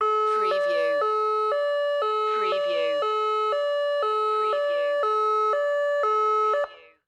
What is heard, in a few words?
Emergency, Medical Ambulance, Siren, Alternating Beeps